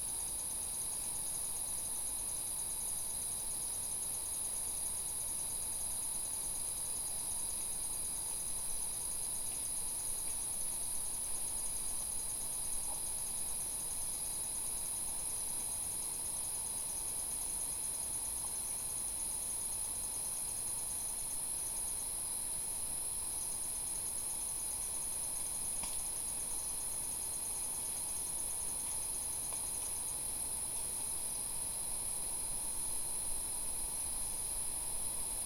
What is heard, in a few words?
Nocturnal forest Gabon